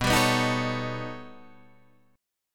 B Augmented 9th B down Guitar Open D

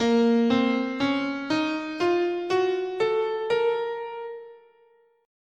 Bb Harmonic Minor, scale

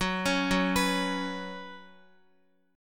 Gb Suspended 4th Gb, down, Guitar; arpeggiated; 4E,4A,5D,5G,5C,6F; JGuitar

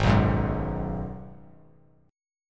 Ab Minor 7th down; strummed; JGuitar

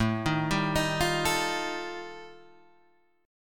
G 7th Suspended 2nd Sharp 5th A chord

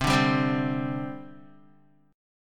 C Suspended 2nd, C, down, Guitar; Csus2 strummed

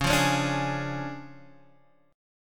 D Minor Major 7th Flat 5th C sharp, guitar

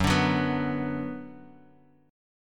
Gb Suspended 4th Sharp 5th, chord; strummed; Bass Standard; 14,17,16,16

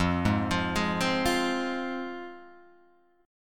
F Minor F down Guitar Open G

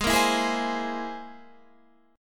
C Augmented 7th Ab

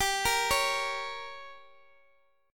G Diminished, Guitar Half Step Down

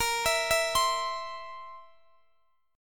Bb Suspended 2nd Flat 5th, Guitar, DADGAD; arpeggiated; x,x,20,21,19,22

